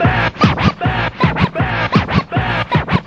full scratching, Jazzmatazz loops

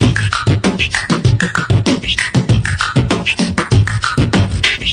cheek rattles, Beatbox, Drum loops

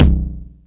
bean eater, Toms Electro

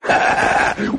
laugh 8 bit arcade evil; ooh ahh

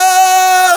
singer, Synths; Notes

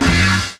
washtub

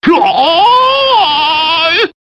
kung fu scream; ooh ahh

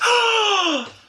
"ah"

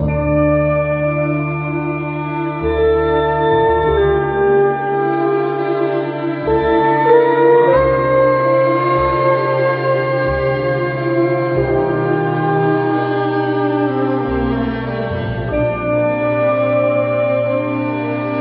strings tumult, Sad Loops

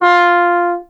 Horns

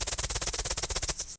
rattle

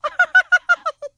laugh; elmo

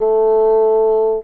bassoon; Winds

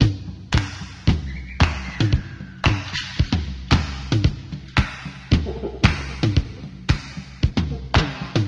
fun with no snare, drum loops

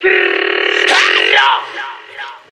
brrrrrr; Voice; ooh ahh

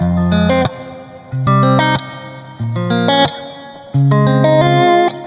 guitar, Loops Phonky

